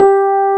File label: SUITCASE, Keyboards Old School